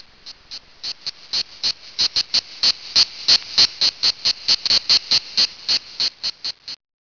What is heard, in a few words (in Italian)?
cicala